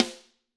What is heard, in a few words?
Snare Crack; Drums; apulSoft apTrigga2; Steven Slate Drums